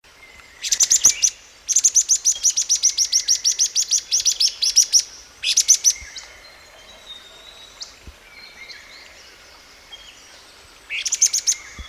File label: Rusty fronted barwing